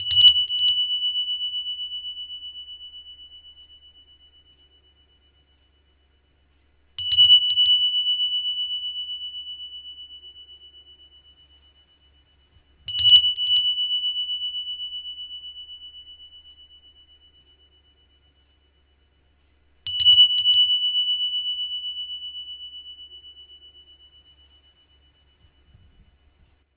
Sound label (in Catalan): Campanes